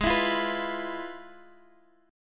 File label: F Minor Major 7th Flat 5th, G sharp